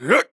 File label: Grunt Jump; Knight; Paladins